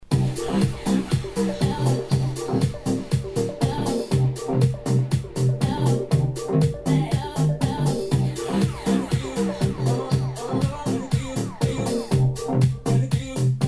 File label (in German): Olympus LS P2 Musik 80 cm Weite neutral ohne Bass